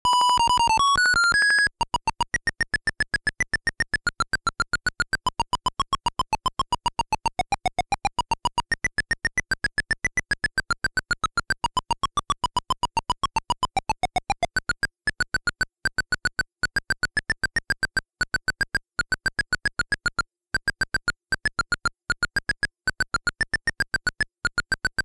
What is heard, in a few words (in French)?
VALSE; Xanadu Sound Collection